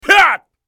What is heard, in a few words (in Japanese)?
男･攻撃; マスケッティア